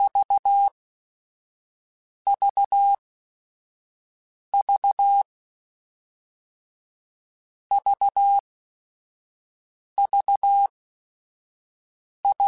TMO0, Morse Code; CW Code Groups; Basic Letter Groups By7Groups; HamRadio; Basic Sets

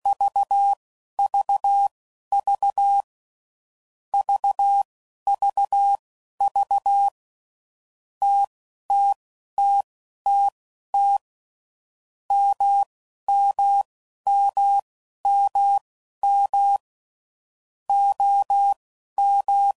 TMO0; Morse Code; CW Code Groups 10WPM; Basic Letter Groups; Basic Sets

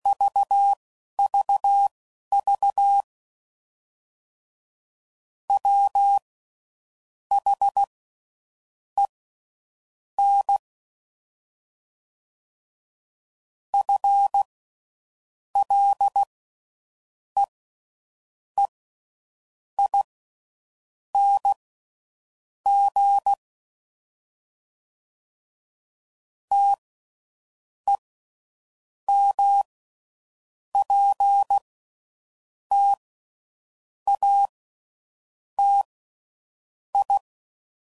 CW Code Groups 5WPM; Sayings; Chuckles

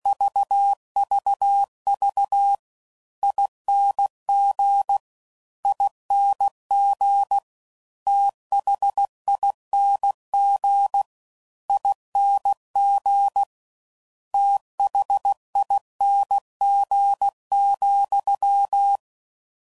Slow With Faster Ings, CW Code Groups 15WPM; Morse Code; Special INGs

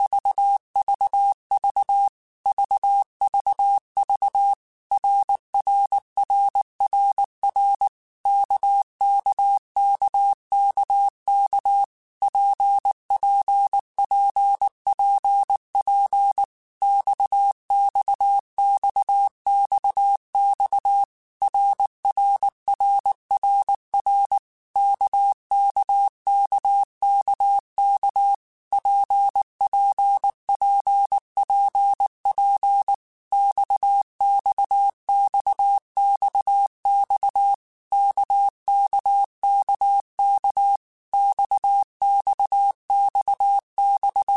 RKPX, Morse Code; HamRadio; Basic Sets

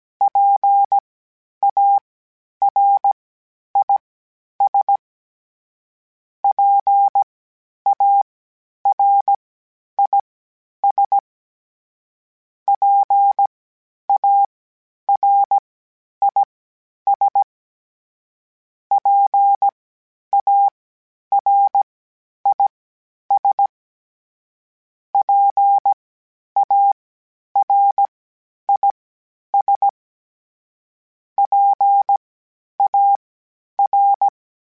PARIS, Morse Code, Calibration; HamRadio

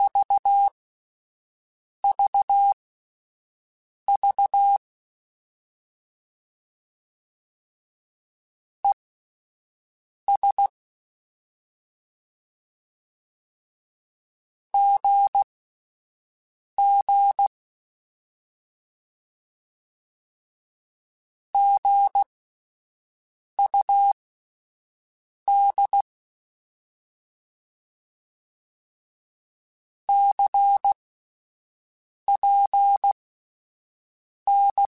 Words, Morse Code; Mixed; HamRadio

